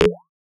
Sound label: SFX droplet